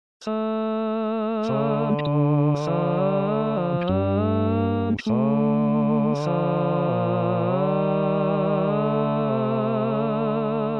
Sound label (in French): Sanctus Hommes; La Messe O magnum Mysteriumde Tomas Luis de Victoria